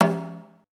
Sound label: Taiko; Puyo Puyo Puzzle Pop; iOS, macOS, mobile